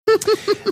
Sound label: high pitched giggle